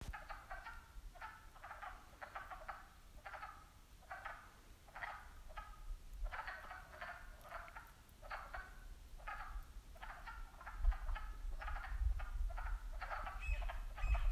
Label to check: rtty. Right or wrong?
Wrong